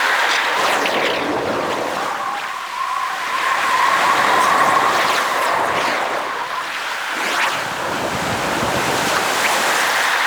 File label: Void Wave Loop; Ultimate Focus; Vazarin; Ultimate Abilities